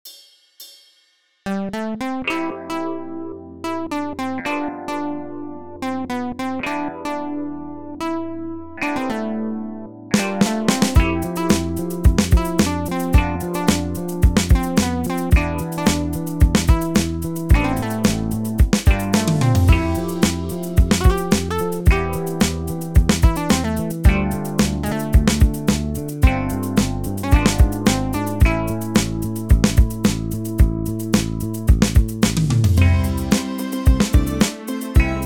110BPM

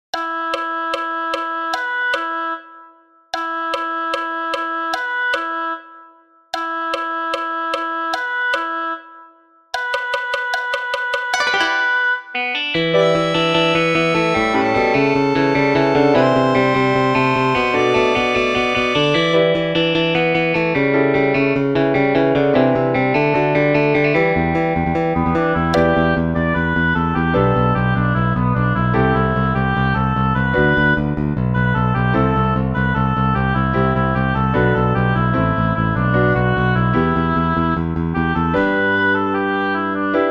MIDI

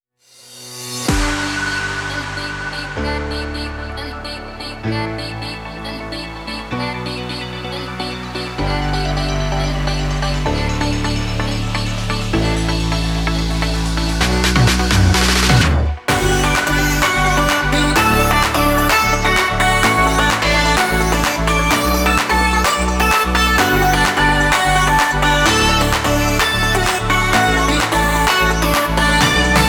BAND-SE

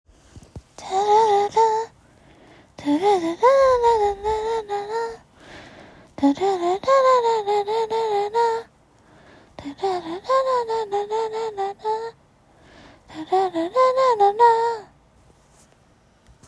BGM; 仮面ライダー

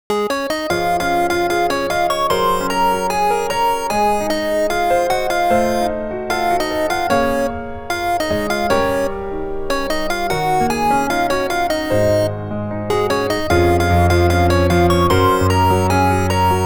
bpm150

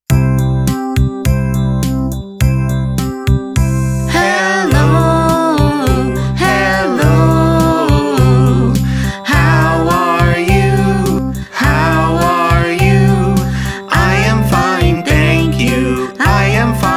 hello song

